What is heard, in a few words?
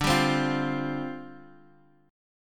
G 7th Suspended 4th, D, guitar chord